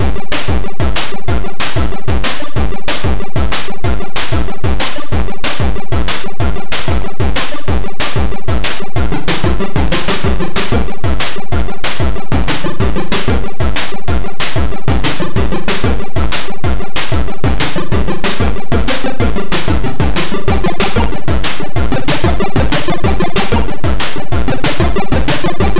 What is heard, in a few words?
arpeggios; Megaphoenix (+arpeggios); CNGSoft